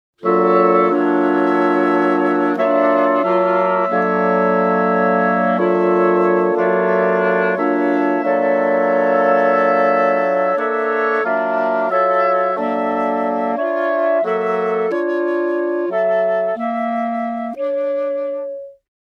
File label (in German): Akkordpuls; gullinkambi